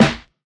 steel snare drum a key, Royality free snare drum sample tuned to the A note; snare-drum-samples